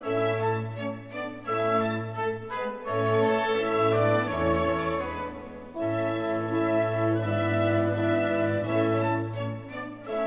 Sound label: Larghetto (Piano conc); Mozart